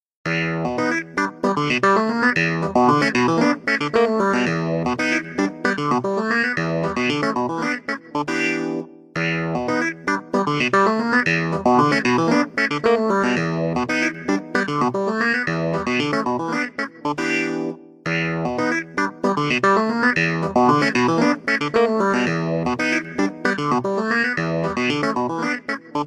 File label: LG K50 Default Ringtone